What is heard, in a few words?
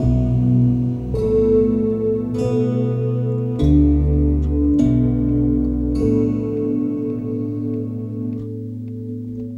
Gong Cycles; Sonic Foundry (Sony Creative Software) - Friendly Fire; Gongs